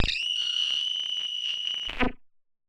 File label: Casio, Glitched, malfunctioning synth